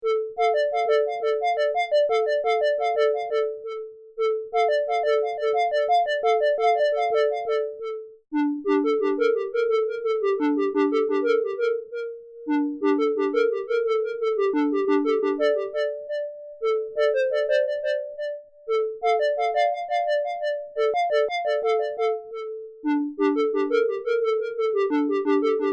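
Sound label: arp 2600 square solo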